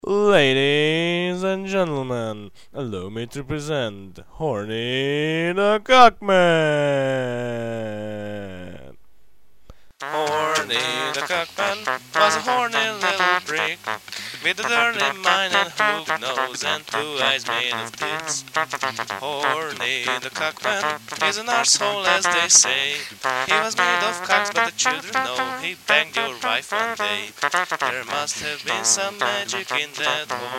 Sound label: jazz